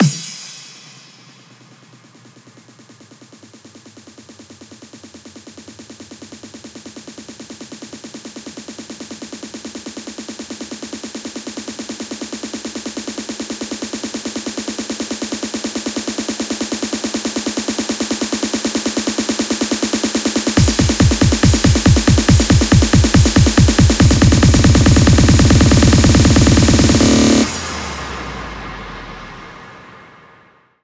140BPM Filler 16Bars, HandsUp & Trance Fills; Manus; Vengeance Samples

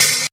Open Hihats, Cymbals; Vengeance Samples

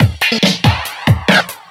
Breakbeat; Vengeance Samples; VEC2 Loops

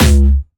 Jumpstyle Kick C#2, vengeance essential bassdrums; vengeance essential club sounds-3; VEC3 Bassdrums

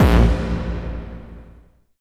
BD Distortion, Bassdrums; Vengeance Samples

